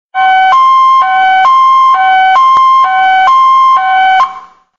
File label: Annoying Siren